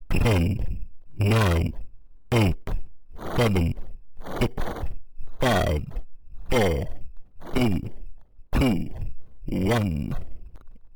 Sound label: Countdown To Launch